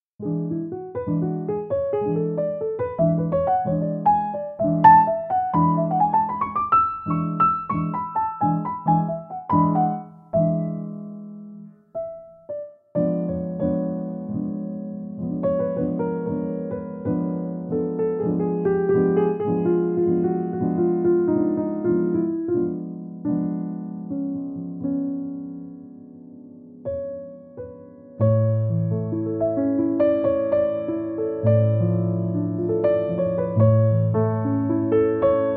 Jazz Instrumental Chill Outdoor Jazz Piano Player

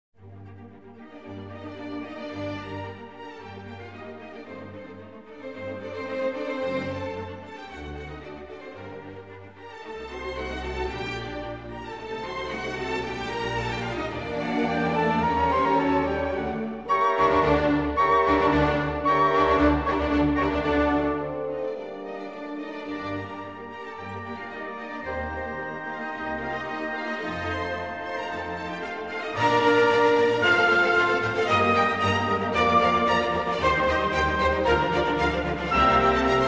Symphony in G Minor, Molto Allegro

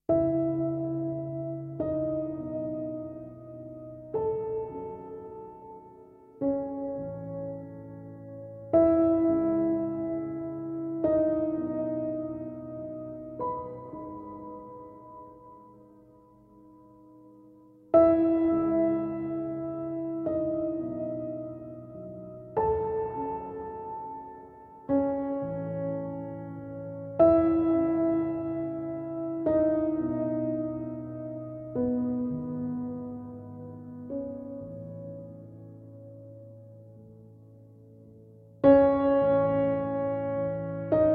Orchestral

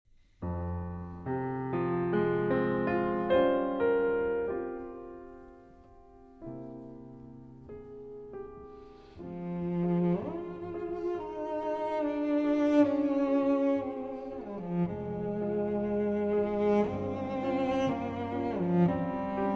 Elégie For Soprano, Cello and Piano; Massenet

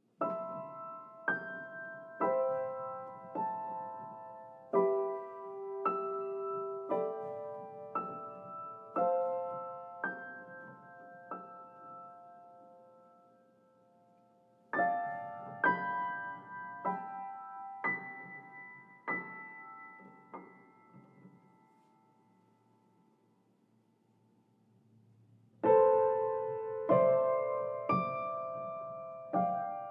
Improvisation

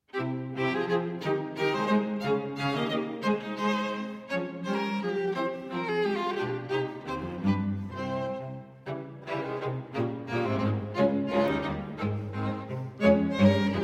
String Quartet in C Minor, Minuetto

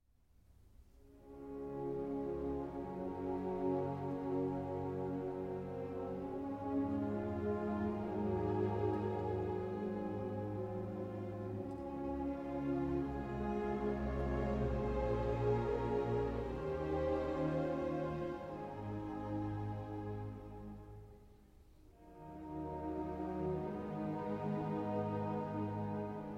Piano Concerto in A Minor, Adagio

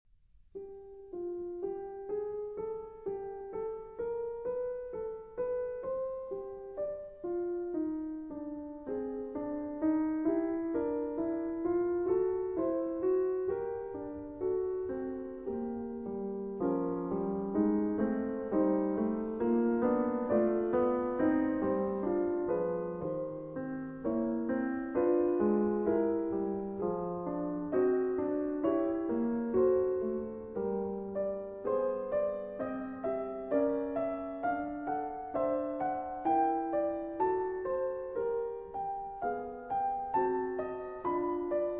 Fugue in C Minor

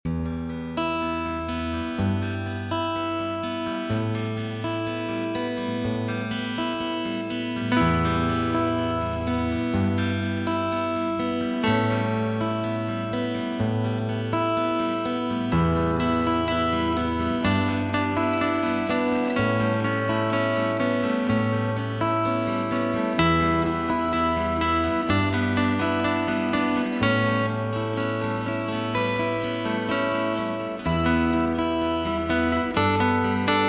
Guitar Relaxing

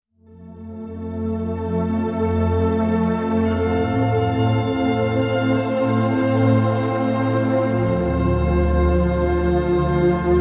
528 Hz Calming Mind

